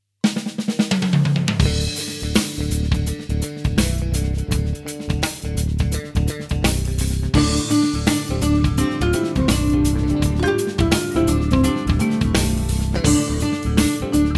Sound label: Shuffle